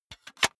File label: cassette case close medium